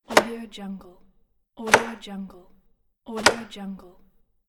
axe hitting wood

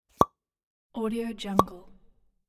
suction pops

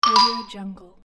xylophone video game pause sound

